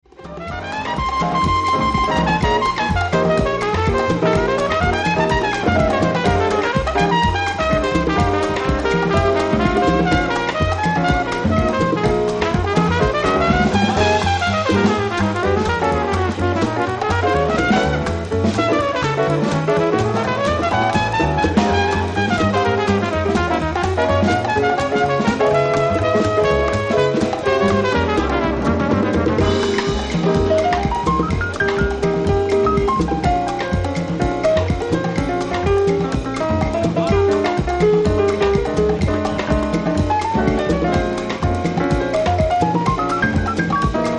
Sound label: Conversation (New Jazz Version)